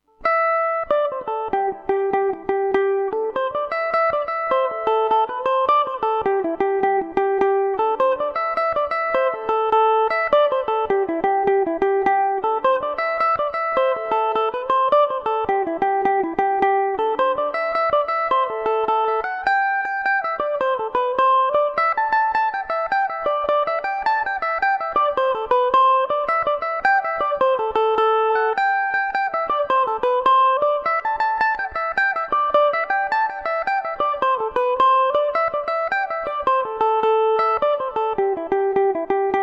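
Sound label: A dorian revelation, Irish mandolin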